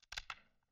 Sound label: break wood; angband